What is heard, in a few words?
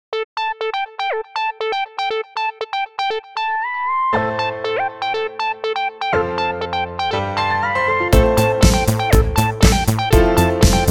Electronic; Action 리듬